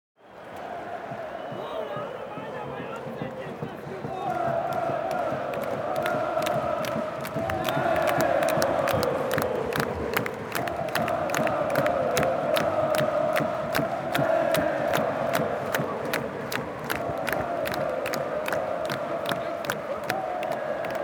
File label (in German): Stadion Atmosphaere